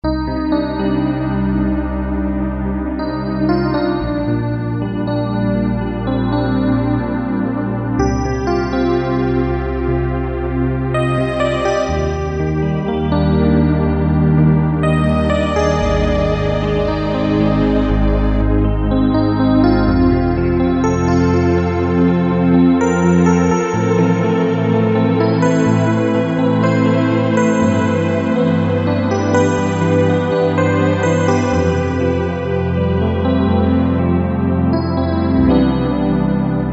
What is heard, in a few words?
EPF STRPAD; Akai SG01v